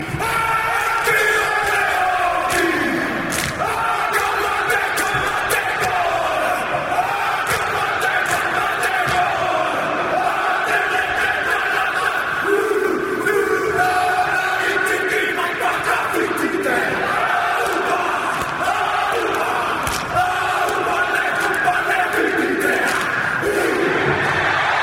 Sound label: Rugby Haka Haka